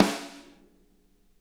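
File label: snare edge; Sonic Reality; Interactive Drum Kits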